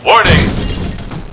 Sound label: fall; quake2; robot